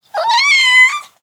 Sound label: Kitten Meow; SES Animals SFX